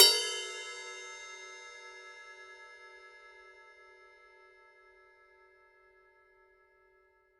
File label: paiste pst 5 rock ride 20 bell